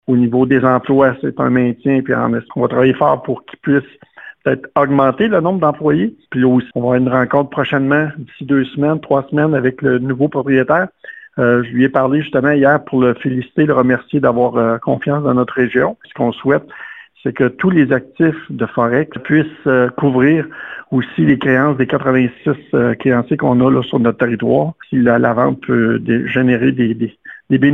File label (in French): bourdon